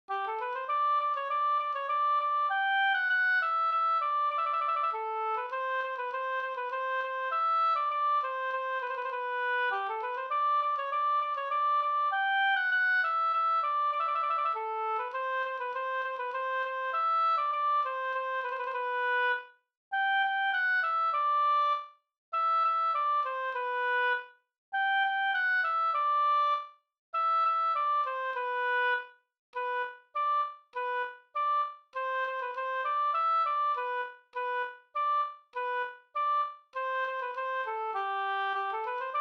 folkdans, polka; Zigenarpolka

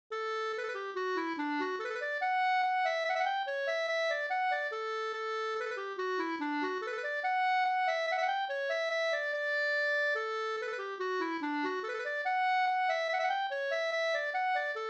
mazurka

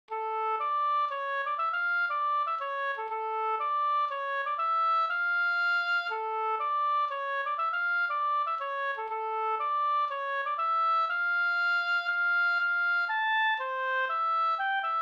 polska Bodapolska; En gang sag jag

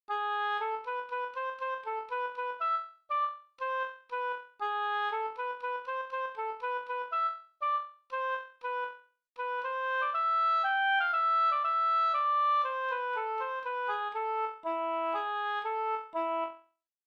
folkdans

